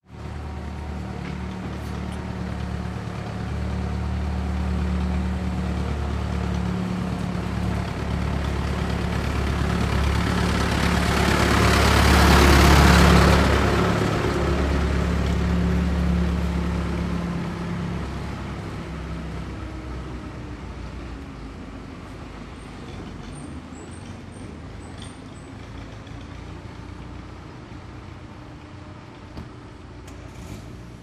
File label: Caterpillar Digger